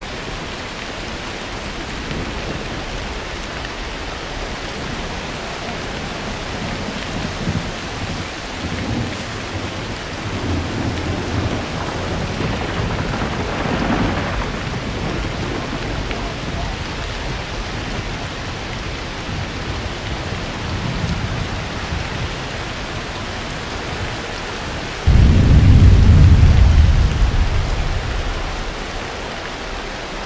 farm rain day; ambient

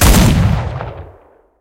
cannon 37mm flak36 shot; cannons; War Thunder

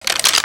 striker reload end; foley; Call of Duty Modern Warfare

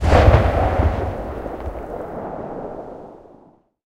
ho301 last shot, guns

